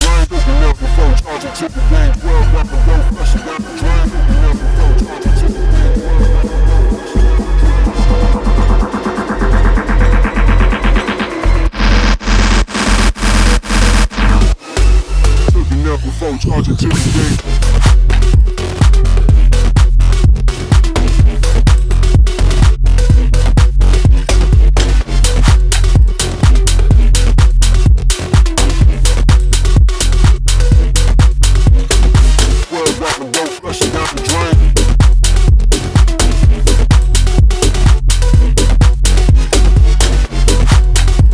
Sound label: Piped Future House